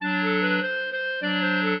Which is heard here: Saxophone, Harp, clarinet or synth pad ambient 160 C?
clarinet